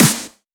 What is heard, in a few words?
Snares; Vengeance - Essential Clubsounds Vol.4